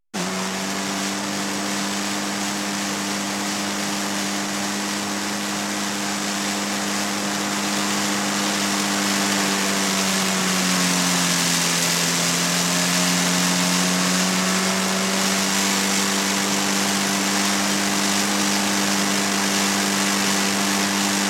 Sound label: Motorcycle Back Riding